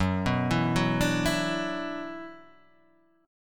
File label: F sharp 7th Suspended 4th Sharp 5th, guitar